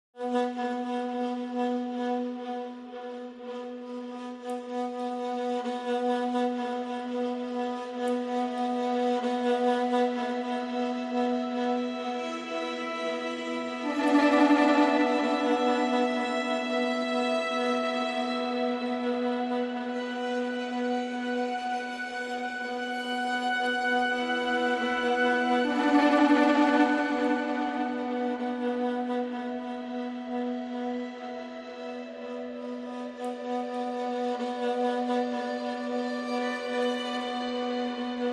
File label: Strings